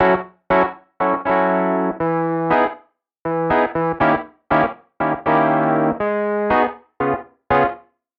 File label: Chords Rhythm Soul; midi wizard 2; Unison